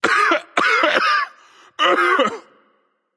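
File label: nonverbal clear throat; smite; voicepack; merlin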